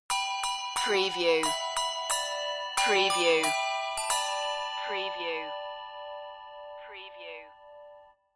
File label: jingle bells handbells